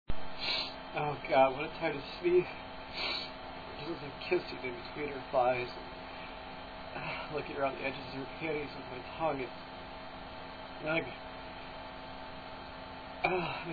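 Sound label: thigh sneezing, male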